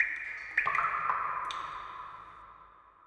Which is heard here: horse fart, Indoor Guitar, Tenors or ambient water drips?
ambient water drips